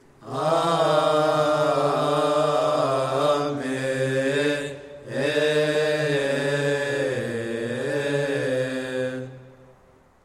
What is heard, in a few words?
Amen; sydney diocese liturgy